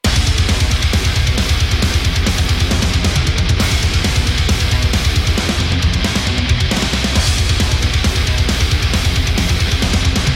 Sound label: drum test